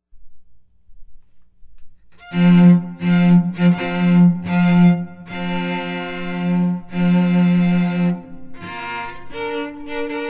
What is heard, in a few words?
strings